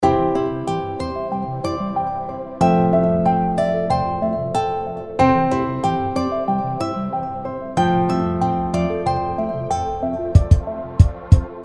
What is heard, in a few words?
alarm, alarms; Meitu Kiss C520